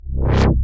Create blackhole; Megaplane

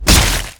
Axe impact; 100rogues

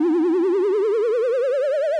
Wiggle up; Megaplane

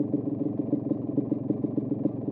Engine loop, Tanks; TinyTanks

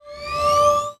Skill heal; 100rogues

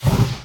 Boss fireball launch; 100rogues

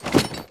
Item armor pickup; 100rogues

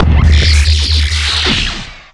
emp impact; TinyTanks

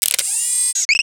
sniper zoom; TinyTanks

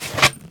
Item weapon pickup; 100rogues

